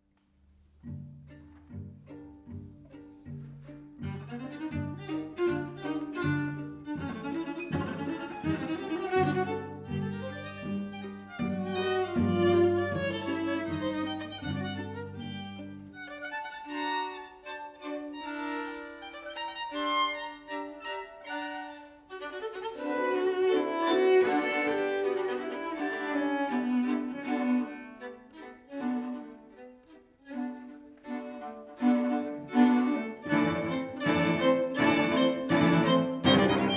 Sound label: String quartet Grieg